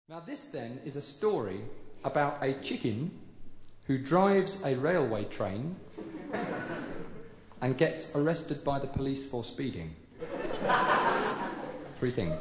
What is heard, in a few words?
chicken blues